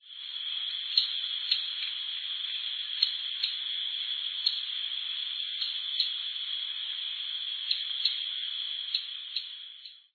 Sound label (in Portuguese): sete-cores-da-amazonia